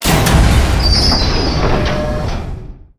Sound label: pillar stop